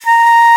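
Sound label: soprano bansuri; World Combos; E-MU Producer Series Vol. 7 – Old World Instruments; World Winds; SOPR. BON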